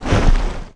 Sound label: eagle wing